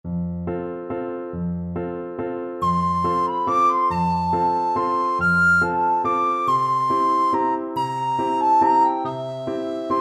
recorder

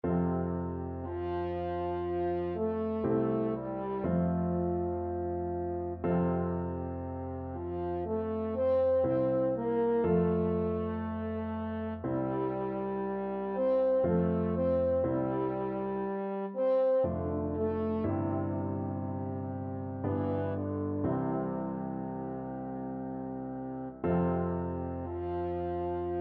clarinet concerto, french horn

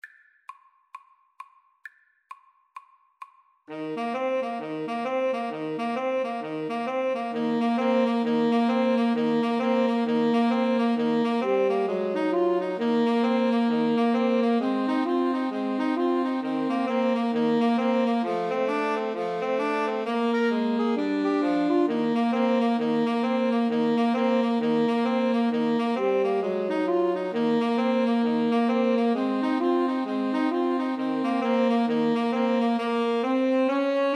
jingle bells boogie, 2 Altos Tenor Sax

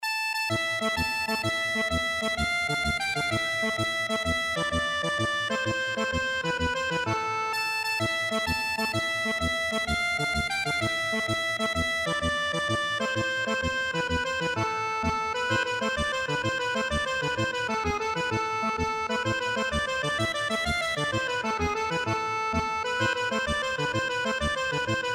tarantella, accordion

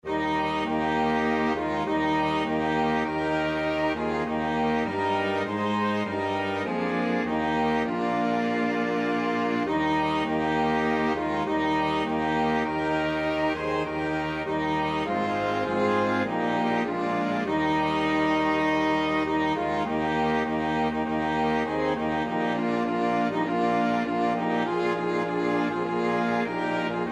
flexible mixed ensemble, 4 players

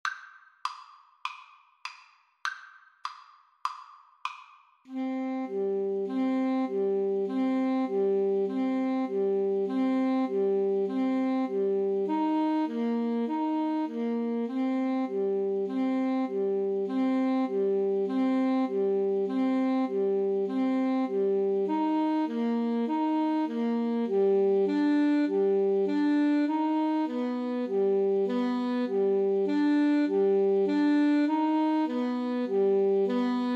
mountain king, Trumpet Saxophone Duet; greig

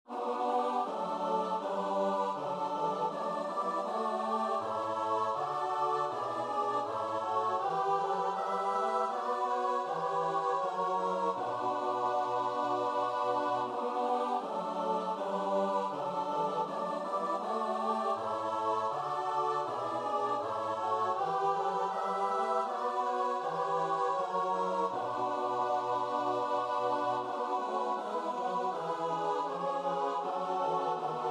st matthew passion, choir; bach

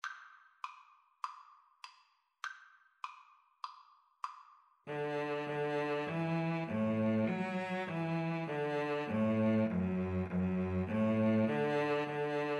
ode to joy, Flute Cello Duet; beethoven

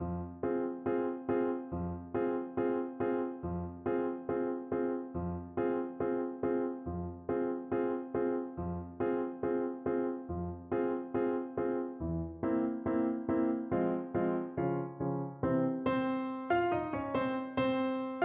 overture, Trumpet; barber seville

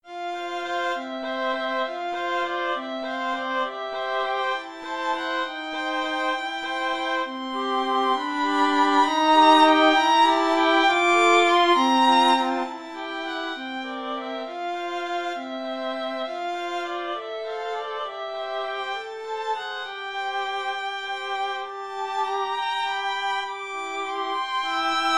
waltz, violin trio

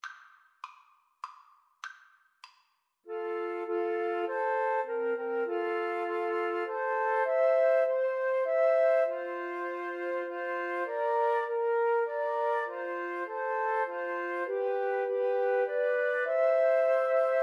god glory, Flute Trio

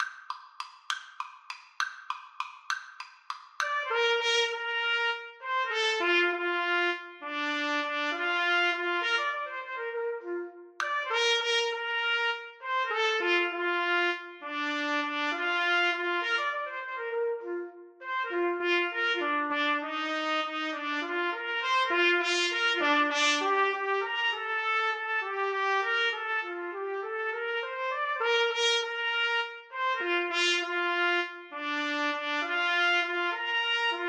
duos menuett, trumpet duet

